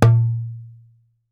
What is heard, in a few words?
darbuka note